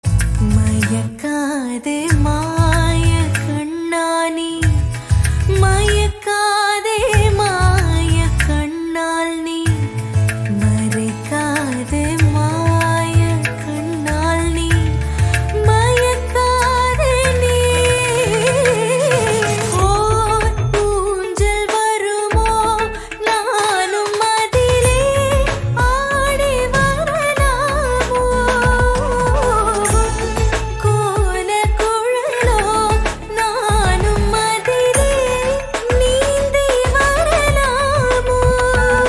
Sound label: Humming Ringtone